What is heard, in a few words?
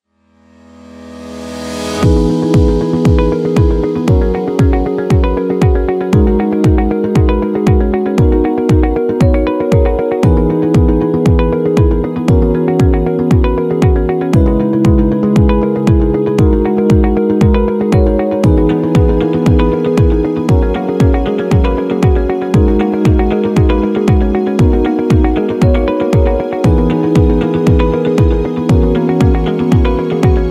Upbeat